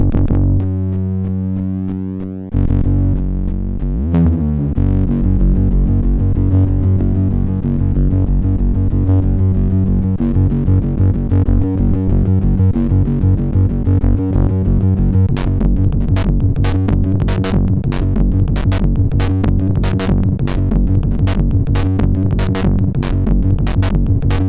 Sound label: Heavy Phasor, sid MOS6581